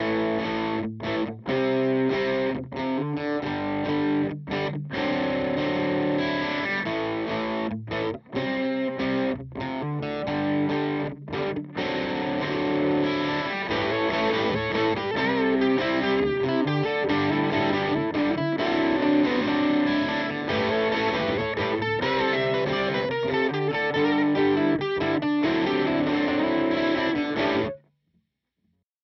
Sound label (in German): HX Stomp Vox AC30 Kompressor